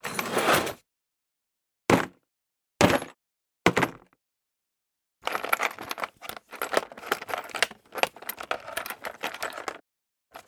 Foley Object Toolbox Drops Movements Searching Stereo